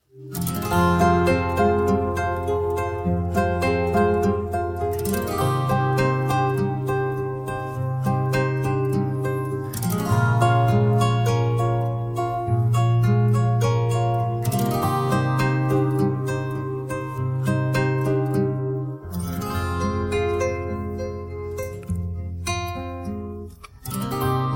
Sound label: guitar Version